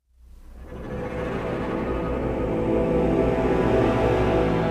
dialog ominous intro

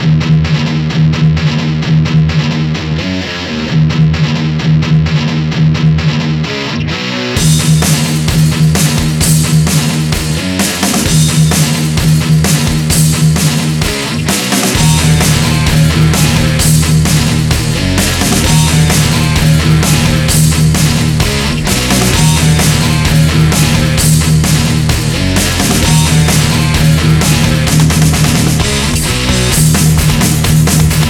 Heavy Riff Looped; Dual Blade Battle of the Female Ninja

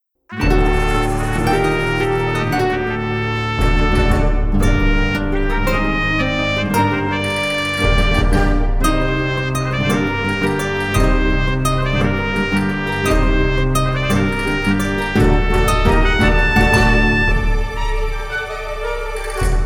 Spanish Travel Music; Pirates of the Burning Sea

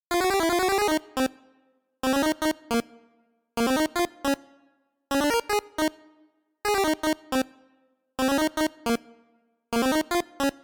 Melody; chippy

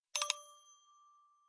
Coin Experience; The Croods

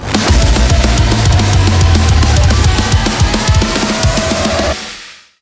transition; Doom

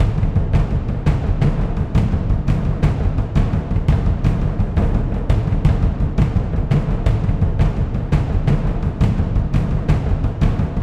Epic Drums; Dual Blade: Battle of the Female Ninja

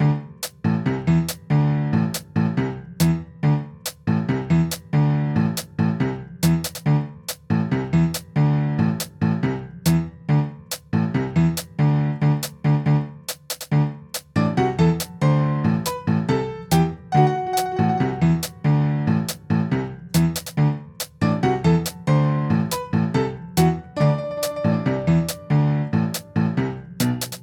Samba; here comes niko; Hairball City

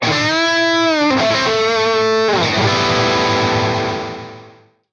Music Jingle; ps vita; gamerip; Scared Rider Xechs Rev.

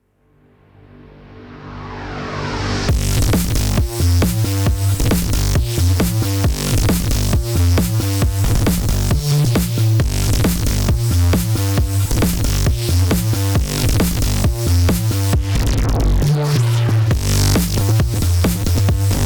Riff; crab champions 2023; Badass Riff

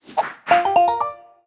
SFX Plus move; Ellen's Garden Restoration; android

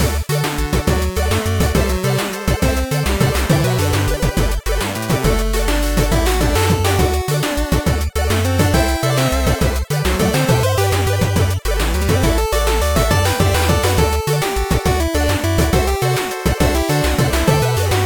Daytime Mono; Sigi A Fart for Melusina

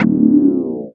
Exit To Windows Sound; Nicktoons Basketball

